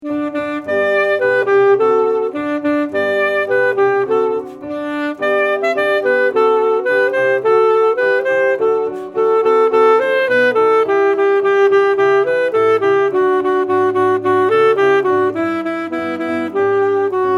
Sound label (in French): 2 saxs